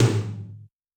Tom, Yamaha DTXpress II

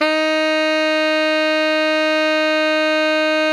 TENOR FF D#4, saxophone; Greytsounds; Philip Wolfe David Crozier's Saxophones

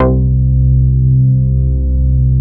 C3, BASS; Q Up Arts; Dream Experience; BASS BANK 11

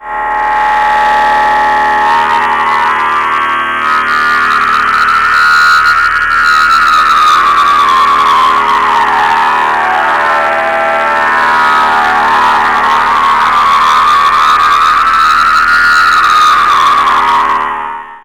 Scary, nightmares; Dream Zone

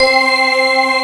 DIGI WAVE, DIGI PAD; AKAI S6000 CD-ROM - Volume 1; SAMPLE WAVE MIXING 2

